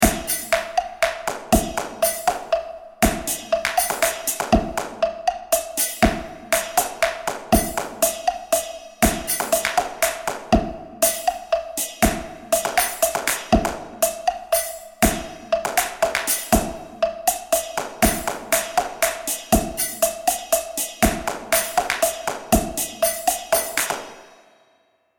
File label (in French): Sabres, Atelier rythme